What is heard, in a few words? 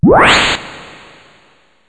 pain; Alien Arena; lezard